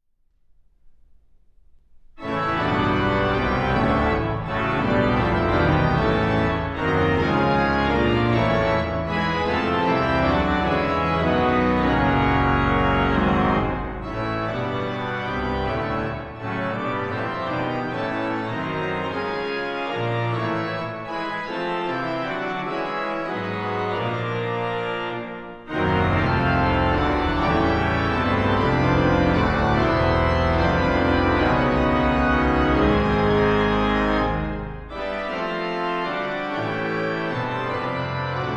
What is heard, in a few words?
Postlude Deo Gratias, organ solo; Ordinary Pieces 2012